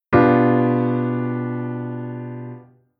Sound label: Chord Inversion 1st Inversion